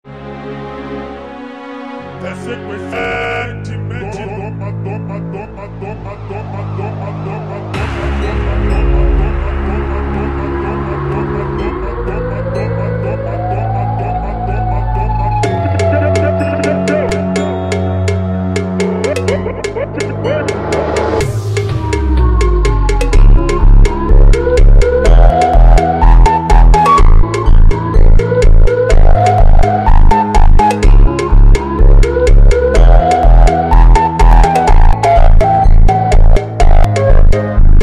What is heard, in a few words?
FUNK (SLOWED)